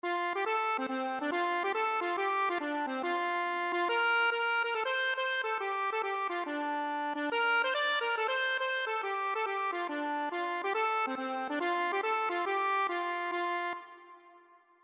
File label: i met her, accordion